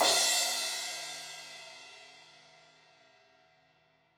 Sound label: Crash 16in edge, Cymbals; Deeply Sampled Drums